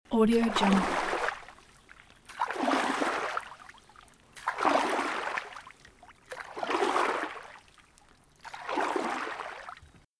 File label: oaring paddling on calm water loop